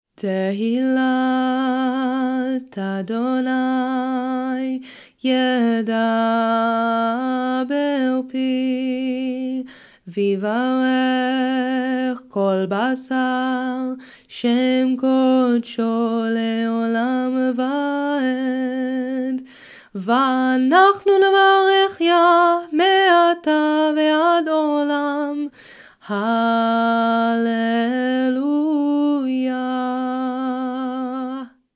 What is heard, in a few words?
Ashrei closing minor tune; weekday minchah